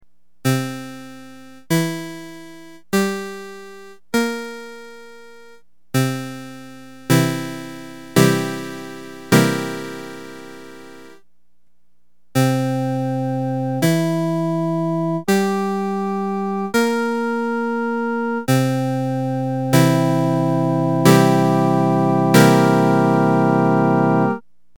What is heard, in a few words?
Percussion, Gibson organ